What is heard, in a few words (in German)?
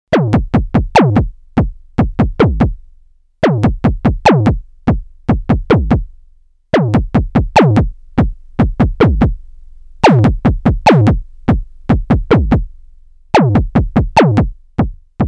tonale Kick trigger cv express